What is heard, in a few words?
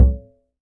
Upright Acoustic Bass; 1ST POSITION; Larry Seyer